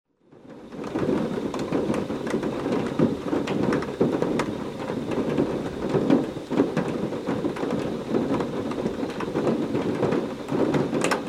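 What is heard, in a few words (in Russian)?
крупные капли с рокотанием грома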